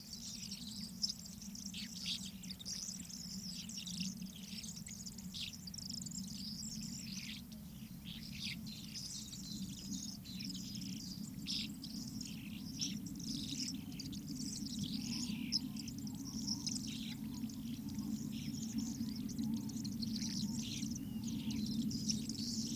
tiny birds; Golden Crayon Sounds

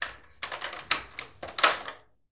door open; Golden Crayon Sounds

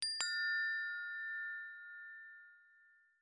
Hummingbird Notification Haptic; iOS Sounds

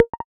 pop up button, Reverb

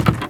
old wood step; Golden Crayon Sounds

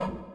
gesture end

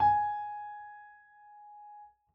piano g#; Golden Crayon Sounds

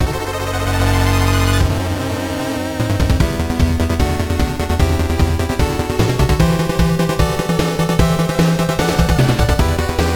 fail jingle; the commentary show shorts sound track

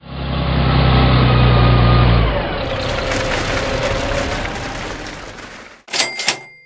House; RS Games Sounds; Monopoly

